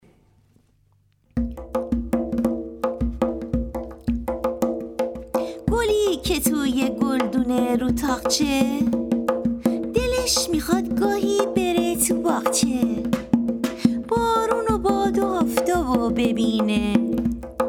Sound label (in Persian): گل و گلدون